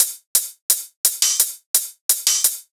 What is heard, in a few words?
172 hihats loop room